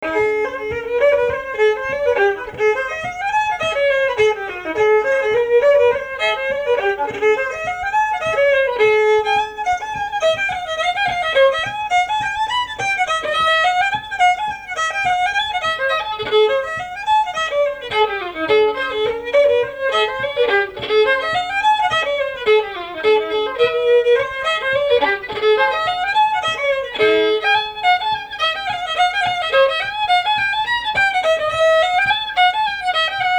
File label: reel a dur